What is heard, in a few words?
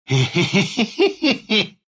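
laugh, Russian; Dota 2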